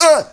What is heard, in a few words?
MALE SOLO, MALE NOISE, Heart of Africa; Spectrasonics; Heart of Africa 1